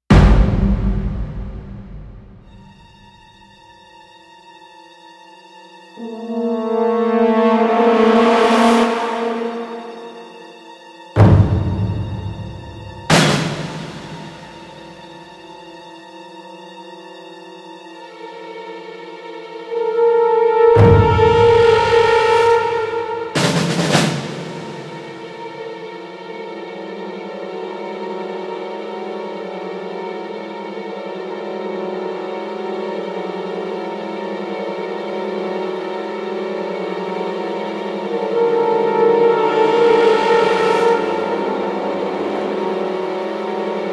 Ambient HEAVY TENSION SOFT EXPLOSION

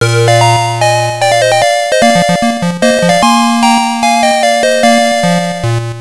Round Start; the tower of druaga; gb

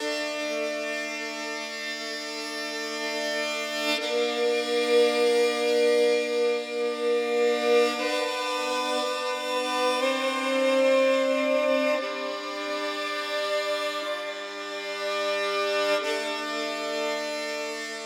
Violin flow; raji an ancient epic

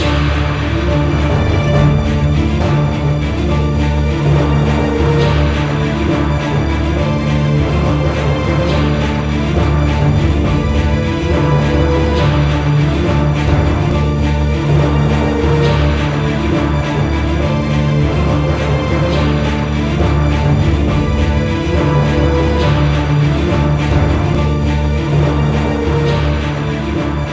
combat fight; Armello The Bandit Clan

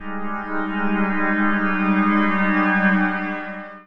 sinister Sound Cue; Voidspire Tactics

